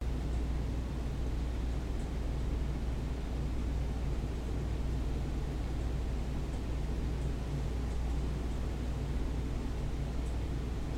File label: room tone; Golden Crayon Sounds